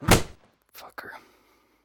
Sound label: fly miss; Blind Drive